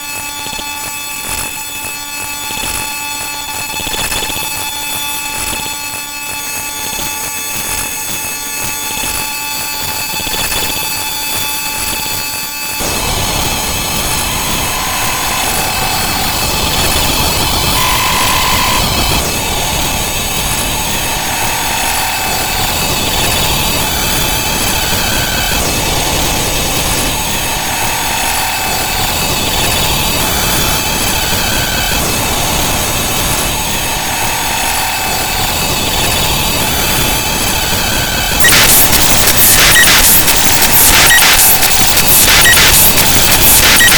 pollution tone; airbrain

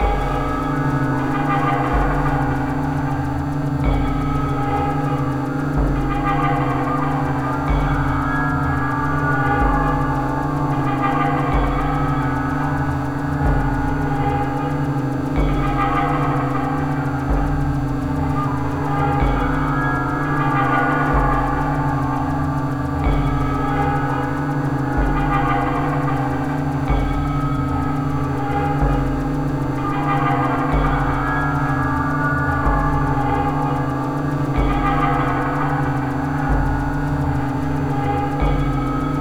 meditation; entrancedbrain; meditation phase

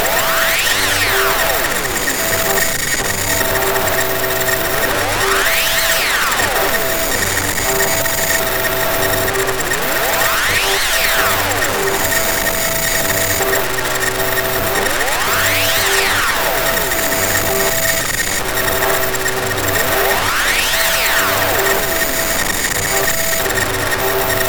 robot atmosphere; technologybrain

